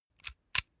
puncher operate empty

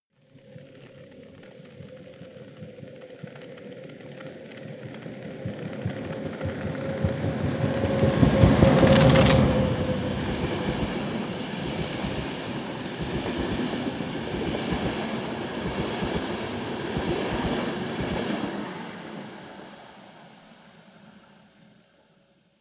train drive by trash flap

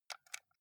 console controller xbox home press

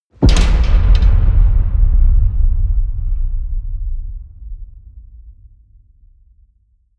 metal crash hollow ring out large

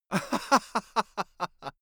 male laugh bright

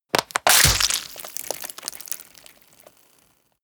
gore rip body apart

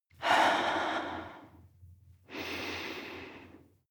breath female mouth out nose in

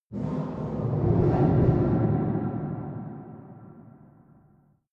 sliding impacts

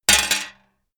metal plate thin drop

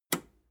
metal button robust release disable

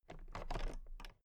toolbox latches open very soft

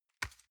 envelope drop soft dry

